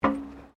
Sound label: FOOTSTEPS WOMENS LOW BOOTS ESCALATOR STEP; Footstep Foley; Footsteps (Sound Effects:Human)